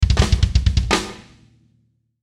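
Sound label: Musical Element, Drum Snare March; Blastwave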